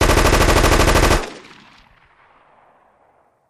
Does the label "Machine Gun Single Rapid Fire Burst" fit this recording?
Yes